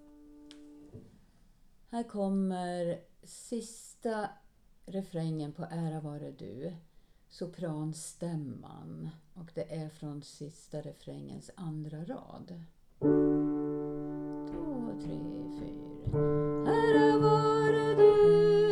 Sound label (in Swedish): slutrefräng sopran; ara vare du